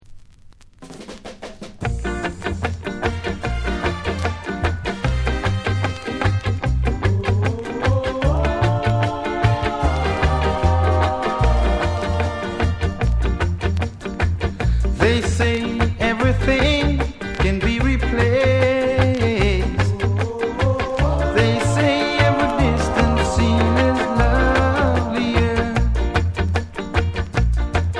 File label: REGGAE